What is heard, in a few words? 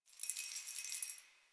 Keys Jingle